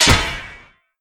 sword pullout

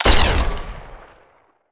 pistol plasma; weapons

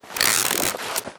bandage

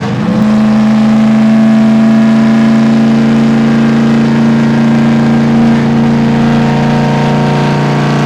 jalopy shift down

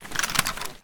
holster

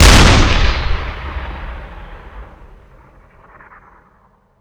tank fire

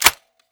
foley barrel open; weapons

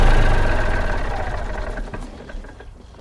crusher stop

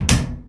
door close

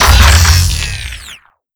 gauss siege stop; doom gauss

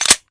slide back, weapons colt1911

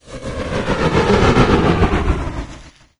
burn, revanent; doom3

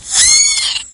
tick sight; doom3

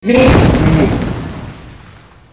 aware

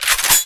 grenade launcher pump; weapons

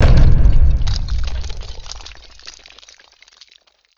ceiling step; cyberdemon

